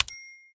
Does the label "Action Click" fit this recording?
Yes